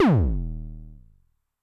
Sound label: Bass Drum Pitch Depth Overdrive, 909; classic machines; BD - BASS DRUM